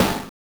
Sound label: Snare, Genesis; TMNT Tournament; M8